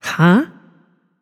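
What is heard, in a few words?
confused, female voice; smite; voicepack; hera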